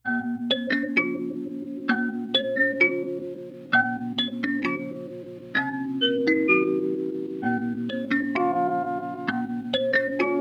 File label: Dark Melody Loop 130 BPM A Min; Cymatics; Oracle Sample Pack